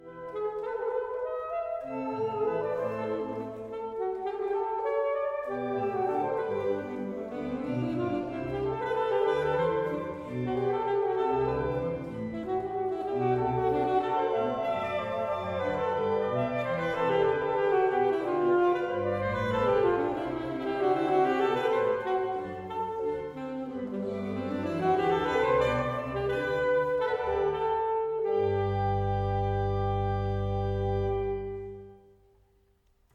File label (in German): Sax Orgel; J.S. Bach BWV 1035 4. Allegro assai; Friedenskirche